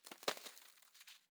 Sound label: ambient; World of Tanks